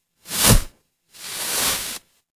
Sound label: paper fabric fabric